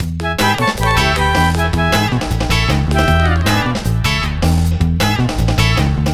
Flutes & Swingers Full E; Zero-G Kocktail Kollection; Construction Kitz (Lounge); Flutes & Swingers 156

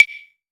CLAVES.AMB; AKAI S-Series CD-ROM Sound Library VOL-5

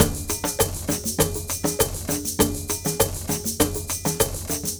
Ensemble, Latin Percussion

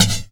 Oneshot; Sonic Foundry (Sony Creative Software); Esoterik Beatz; Quesy

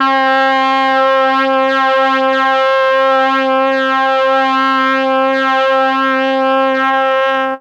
MEL BRASS; ILIO; Propeller Island; Legendary M400

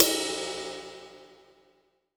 RIDE, Drum Dry; East Collexion; Drum 1 Dry

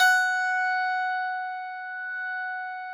F, Harpsichord; F3; Harpsichord -ped; Total Piano

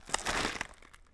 Rocky Step, outdoor, Footsteps

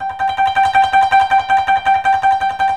KOTO TREM

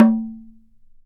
CUICA; ILIO

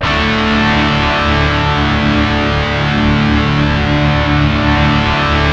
GUSH CRUSH, Bizarre Guitar; Spectrasonics; GUSHERS

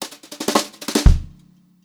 JZ FILL, Brushed Drum Loops, JAZZY; USB Soundscan vol.39; AKAI; 90 s Sample CDs

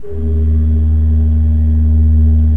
D1, Cathedral Organ; ILIO; Propeller Islands

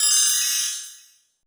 BELLTREE; R+B KITS2

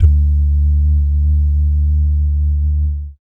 BS DUMMP; Spectrasonics; Vocal Planet; Jazz FX

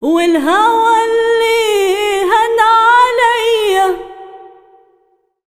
SINGER, ArabianTraditions; USB Soundscan vol.43; AKAI

